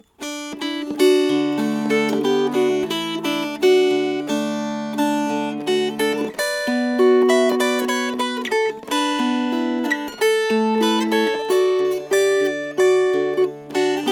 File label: Fingerpicked, Dulcimer